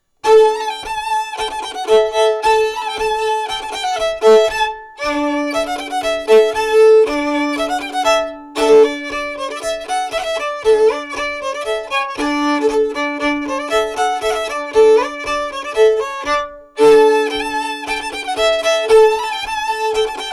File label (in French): Polka piquée; Polka piquée de Siccard